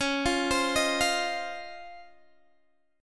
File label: Db Major 9th, Guitar, Open D